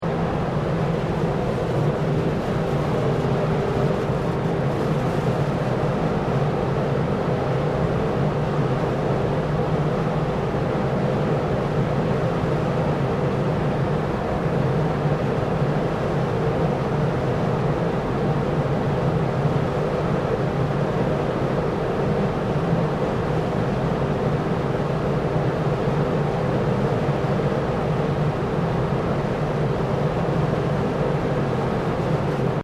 Industrial Water Evaporator Large Operating Loop; Various industrial machines; Machinery

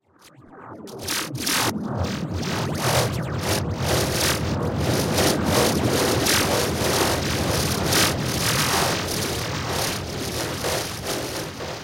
Passing Through The Laser Shower; Andrew Fleming

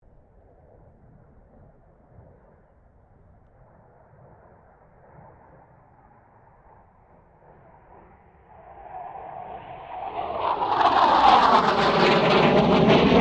AIRCRAFT CA-27 SABRE PASS STEREO; F-86 Sabre; Airplanes; Vehicles

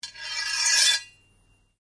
Blade Scrape Slow Ring, Sword; Foley sounds for melee weapons; Swords (Sound Effects:Combat)

